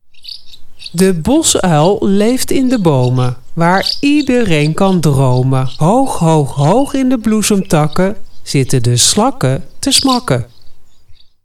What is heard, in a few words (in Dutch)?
De bosuil leeft in de bomen